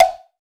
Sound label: Mokugyo; Roland BK-5; Percussion